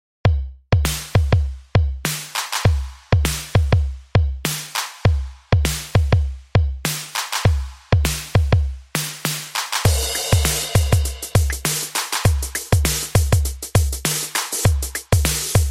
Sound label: ATTACK RITMO